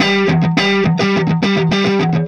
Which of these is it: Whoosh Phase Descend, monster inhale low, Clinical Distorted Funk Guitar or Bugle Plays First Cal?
Clinical Distorted Funk Guitar